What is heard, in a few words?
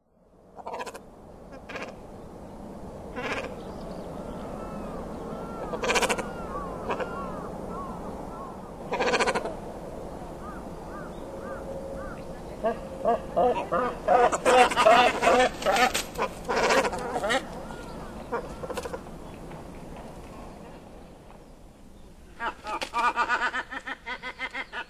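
kawau, Great Cormorant; カワウ; 兵庫県南部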